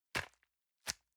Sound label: envelope grab release medium